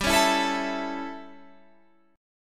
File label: A Major G; A/G strummed